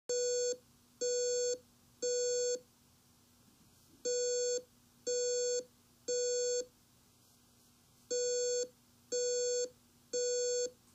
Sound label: Temporal 3 fire alarm sound